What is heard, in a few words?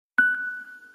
BIP